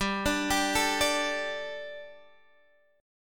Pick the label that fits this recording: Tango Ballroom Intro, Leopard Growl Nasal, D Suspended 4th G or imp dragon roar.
D Suspended 4th G